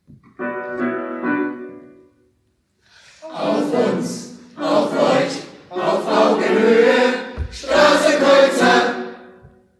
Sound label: Jingle